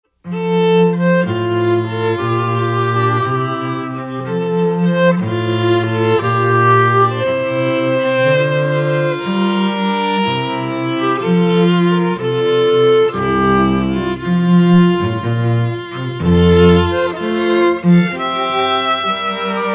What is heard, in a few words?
Trio Handel Aire, string trio; Water Music